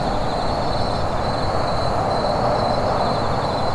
outside crickets; Dystopia

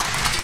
dispenser ammo; Dystopia

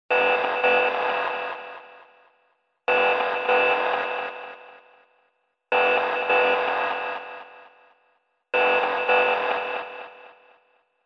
warning siren; Dystopia

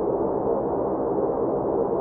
ambient loop; Dystopia; meatspace

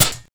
bounce, grenade; weapons; Dystopia

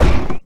heavy wood, footsteps; player; heavyfeet; Dystopia

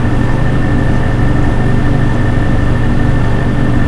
old fan

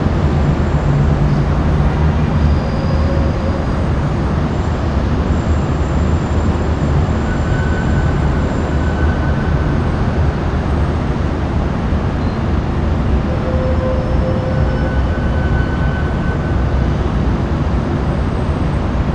city; Dystopia; vaccine

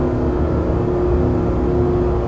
bathroom ambient; Dystopia

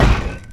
heavy concrete, footsteps; player; heavyfeet; Dystopia

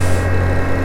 elevator move loop; Dystopia

